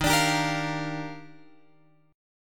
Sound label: D sharp Major 9th